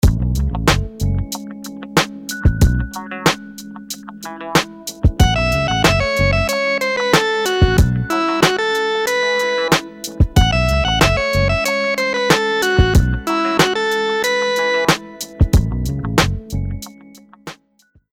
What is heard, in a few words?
rhythms dotted 8s